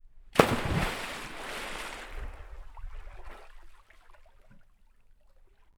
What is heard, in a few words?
Water; TOOLKIT vol.2